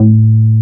FAME A2, BASSES

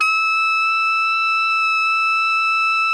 ALTO FF E 5, saxophones; Greytsounds - Philip Wolfe David Crozier's Saxophones; ALTO SWITCH

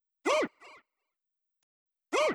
Drum Loop 128BPM FX; VEC5 Drumloops; VEC5 Multi Loops; Loop Elements

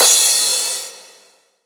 Crash, Cymbals; Vengeance - Essential Clubsounds Vol.5

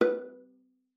B4, VIOLA PIZZ; Xsample VOL-5; 90 s Sample CDs

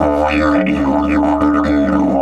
108, DIDGE 108BPM

